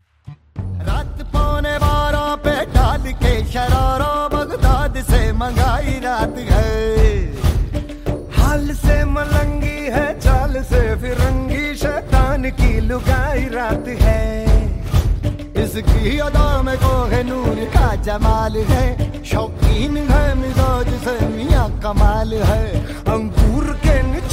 telugu tune ringtone

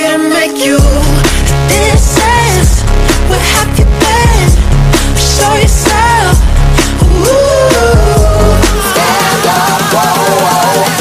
hot electro ringtone

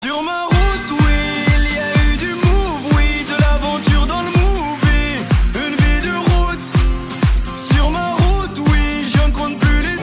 french ringtone

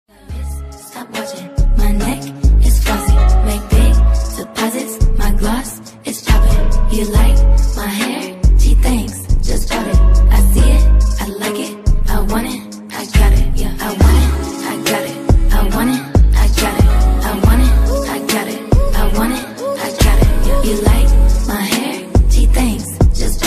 english ringtone

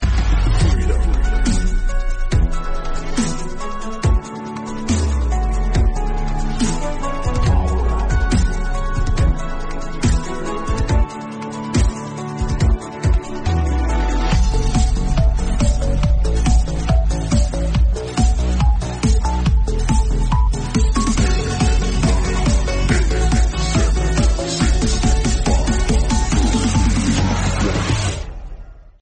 note 8 edge ringtone

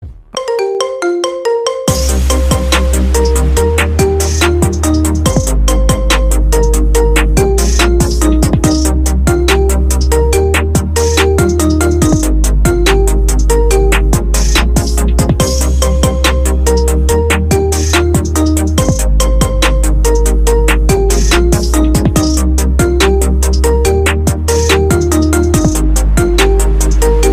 dream glow marimba ringtone; new iphone 2019

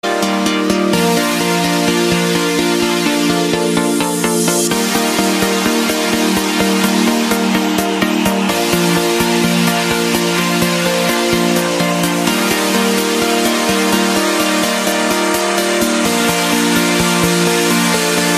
trance ringtone; tomorrowland

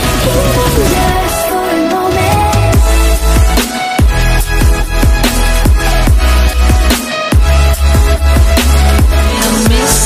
electro ringtone

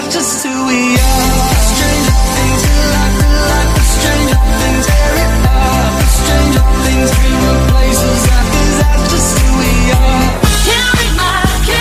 electro sad music ringtone

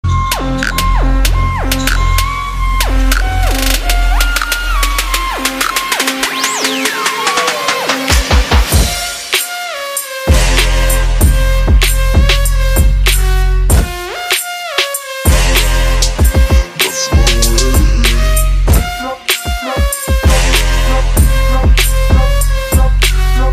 iphone xi bounce ringtone

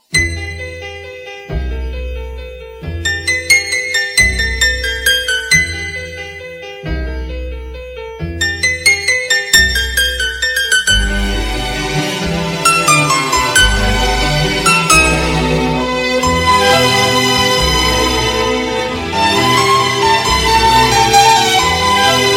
oriental new nokia 6 ringtone

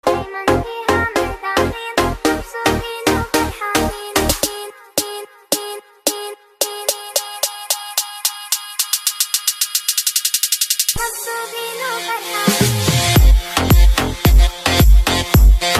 trance beautiful ringtone

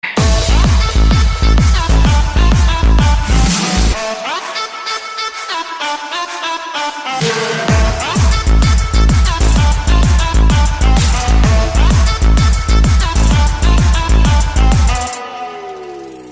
edm ringtone

